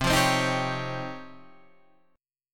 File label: Db Major Sharp 11th C